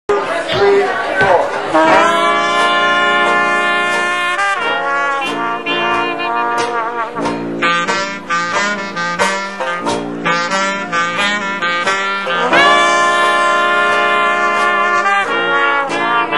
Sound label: Low-Down Blues